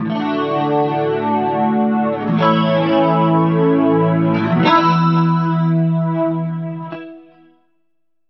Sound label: guitar, stringed instruments